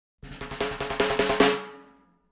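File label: Drums Snare Comedy Accent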